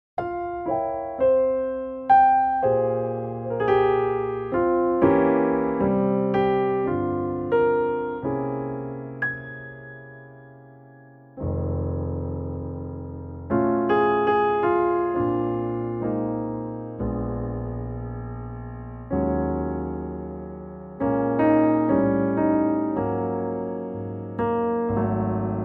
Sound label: Ab Piano; key - Ab - vocal range - Gb to C; Beautiful and lyrical piano only arrangement, with no tempo in mind